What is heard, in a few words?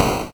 explosion small